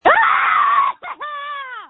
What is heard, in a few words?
screams